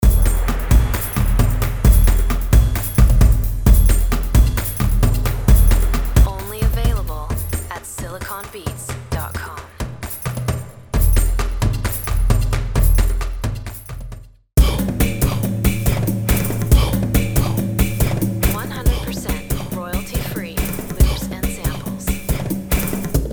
tribal drums

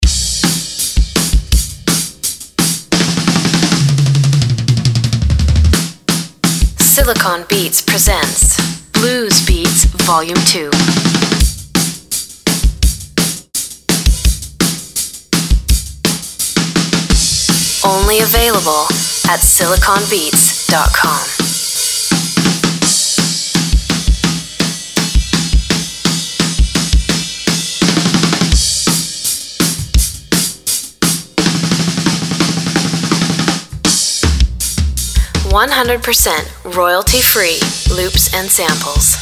blues beats

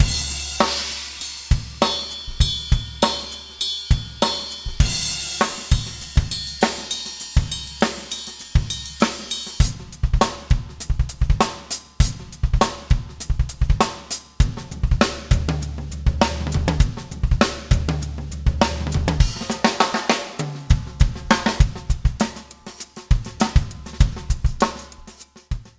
retro metal

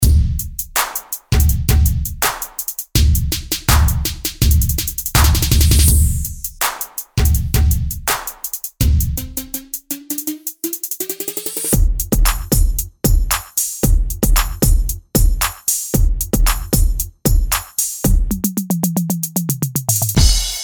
beats 4 rhymes

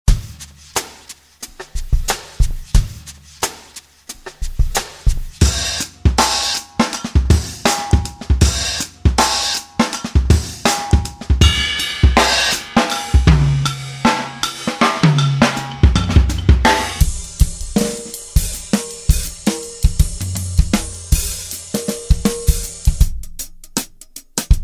chill lounge

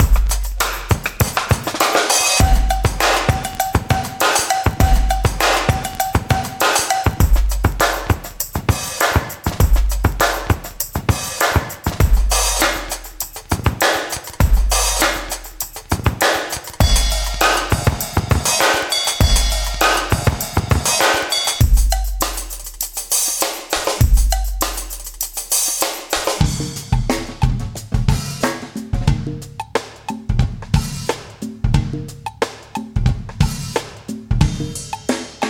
acoustic dope beats

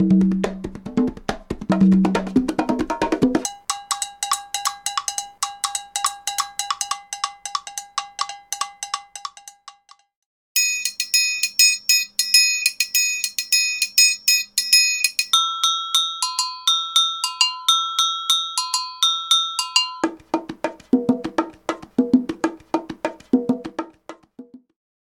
latin percussion

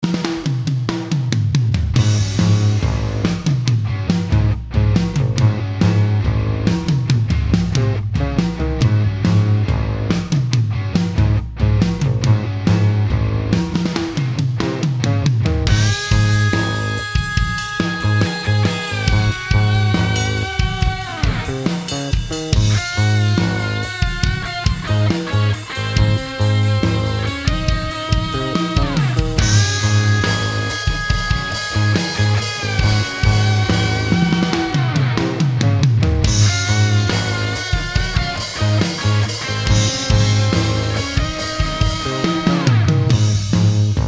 total rock 140

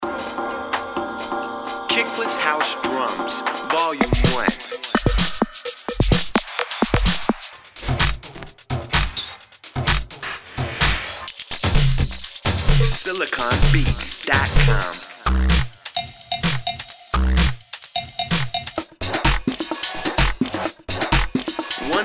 kickless house drums

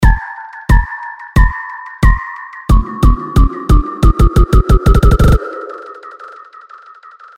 slow riser

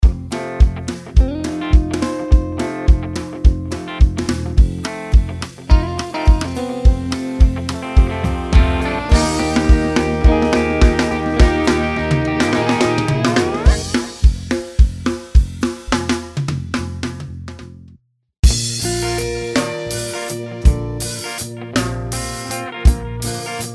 nashville grooves

